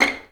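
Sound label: violin pizzicato C6; Best Service; Peter Siedlaczek's Advanced Orchestra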